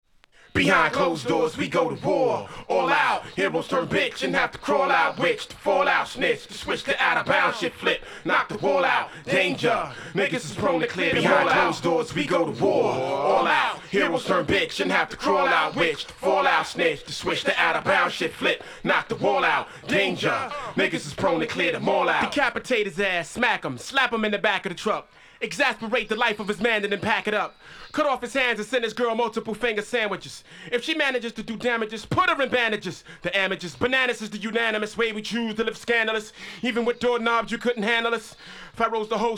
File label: acappella